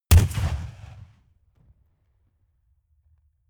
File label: rifle ak-47 exterior fire single bass; comedy-cartoon; Pistols and guns; pistolen-gewehre